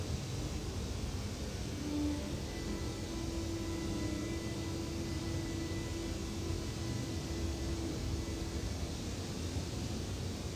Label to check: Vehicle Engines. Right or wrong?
Wrong